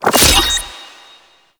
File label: Delivery Key Pickup; Gameplay; Warframe; Nineteeen Ninety Nine; PvPvE